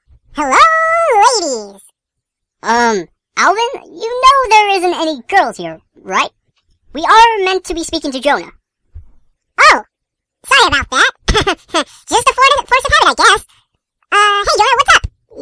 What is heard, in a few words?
woo